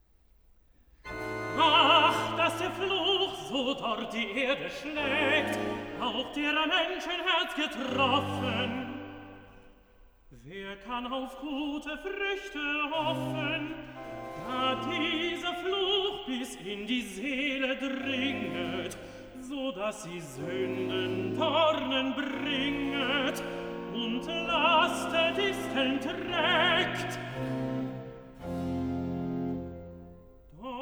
Cantata, Recitativo; BWV 136 'Erforsche mich, Gott, und erfahre mein Herz'; Bach; Rendsburg; 8th Sun After Trinity

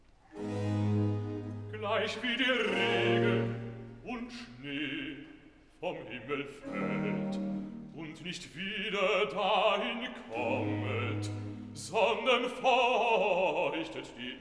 Recitativo; Cantata, BWV 18 'Gleichwie der Regen und Schnee vom Himmel fällt'; Bach; Southwell; Sexagesima

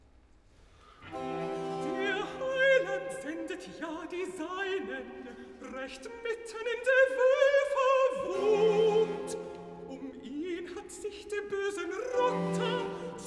Cantata, Recitativo (Alt); BWV 139 'Wohl dem, der sich aud seinen Gott'; Bach; Winchester; 23rd Sun After Trinity

